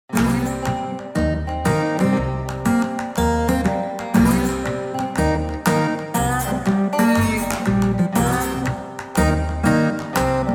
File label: Delta Slide